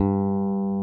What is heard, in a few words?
JAZZ FINGER G3, BASS; Greytsounds; JAZZ+P BASS